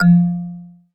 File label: mallet F; Polyend; ARC Noise